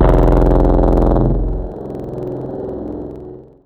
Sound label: One Shot LD Busy, synth; Wavetables; Synth One Shots; Synthetic's Serum Collection; ESW Core Wavetables; Echo Sound Works Core Tables